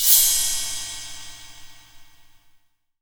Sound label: 18 OLD, 18INCH OLD ZIL RIDE